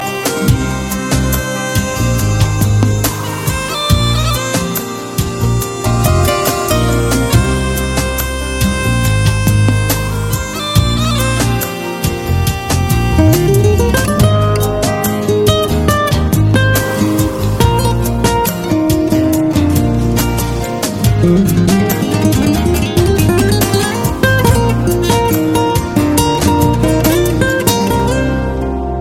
Soft Guitar

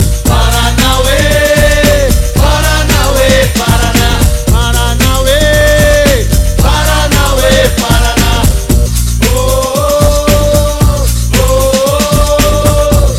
Capoeira Short

